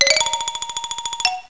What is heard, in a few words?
XYLOFONE; Schlaginstrumente